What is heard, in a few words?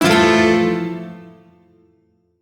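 Shawzin Major Chord; Music; Warframe; Duviri; Chords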